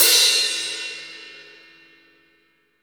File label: CRASH, OLD ZIL CRS